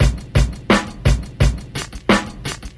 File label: cliched, Drum loops Boombap